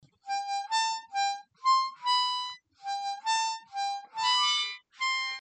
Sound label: clean, harmonica, Happy Birthday